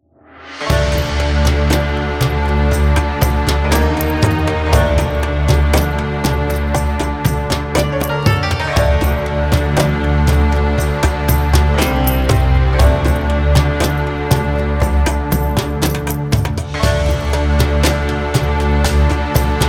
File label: Persian Streets, background music